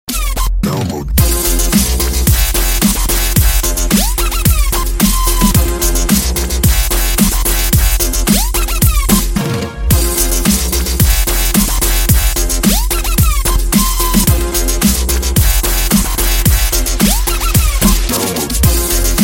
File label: Dubstep